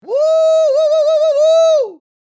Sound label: Male win reaction